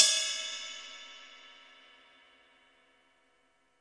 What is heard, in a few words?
CYM RIDE, DRUMS SINGLE HITS